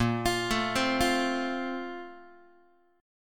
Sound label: A sharp Major 7th Suspended 2nd, Guitar; A#M7sus2; arpeggiated; JGuitar